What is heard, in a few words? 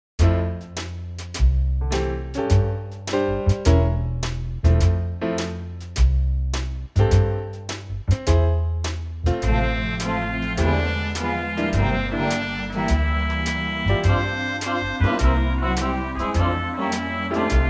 karaoke